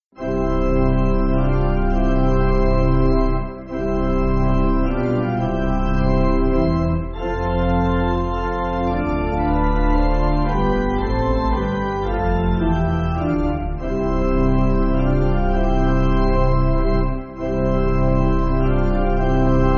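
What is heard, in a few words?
Pipe, Pipe Organ, Music Backing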